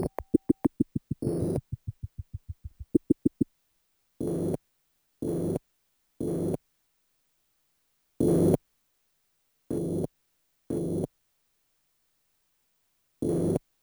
030bpm; elektron machinedrum

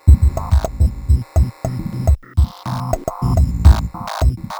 105bpm; elektron machinedrum

